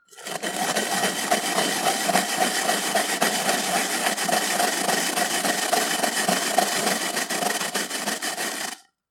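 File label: grating daikon radish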